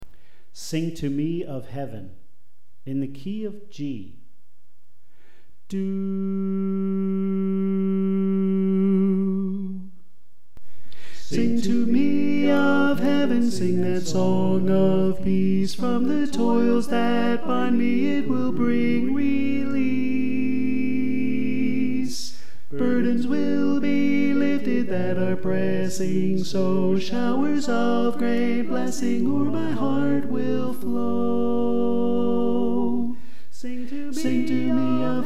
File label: Tenor